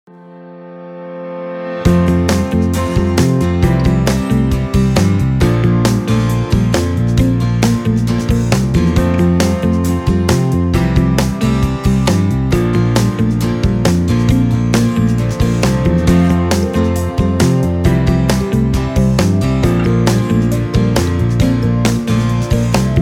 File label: cheer up; Вас приветствует магазин «Название магазина»! Ваш звонок очень важен для нас, оставайтес